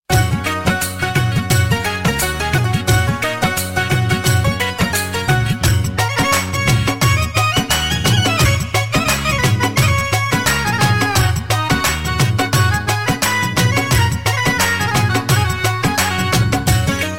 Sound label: Bangla